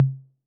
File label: Tom Mid A 808, Clean Digital